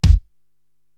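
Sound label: bass drum Heavy Foot, Korg TR-Rack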